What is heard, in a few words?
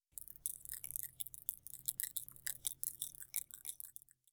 Spider Chattering